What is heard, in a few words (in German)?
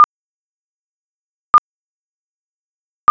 Verbindungs Ende